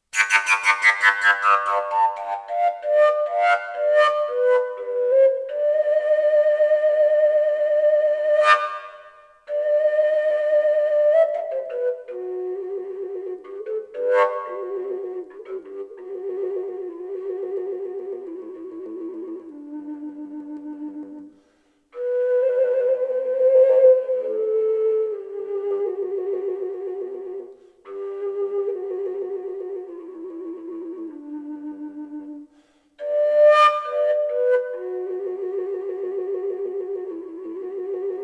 g fujara thin; overtone fujara; concert

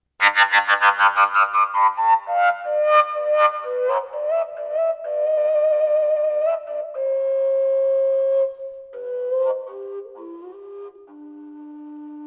g dark, overtone fujara

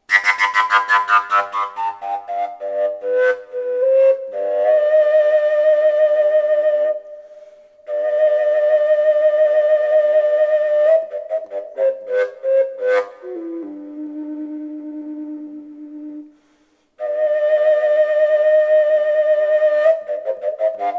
collapsible, g fujara; folkart slovakia

